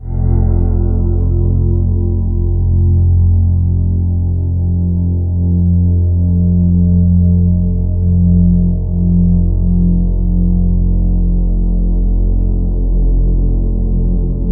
hypnose, low tones, ominous drones; USB Soundscan vol.48; AKAI

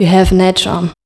PHRASE, Voice Spectral

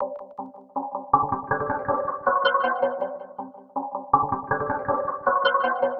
Seq Elves Tripping, Drum & Bass; Sonic Foundry (Sony Creative Software); Knutrix Drum & Bass

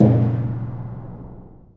Af. Hand Drm, Ambient; Roland LCDP14 Africa VOL-2; Ambient Tar

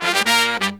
Pop & Funk Brass

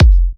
F, kicks; markus hakala kicks

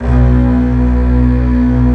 Section String B0; Proteus 2000; Digital Sound Factory Proteus 2000

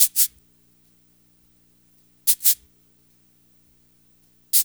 CABASA; East West; Funky Ass Loops

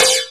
Cow hit, EMU PX-7 drum machine

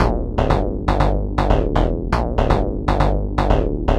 Bass Line; Eclectic Electronica

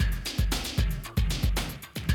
Classic Drum Loops; Best Service; XXL 1500 Classic DrumLoops; Sample CDs

